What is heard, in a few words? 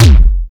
base drum; DRUMS